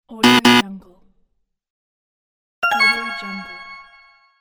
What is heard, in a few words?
right wrong